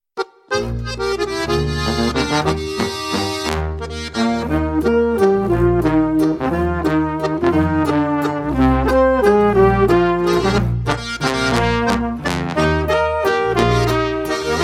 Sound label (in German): walzer; weissngroana; flockenwalzer